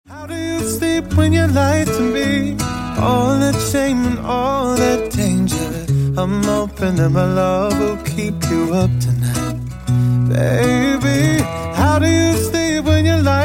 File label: acoustic